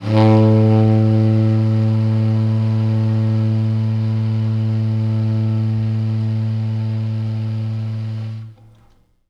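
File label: T Sax, tenor sax; B. Webster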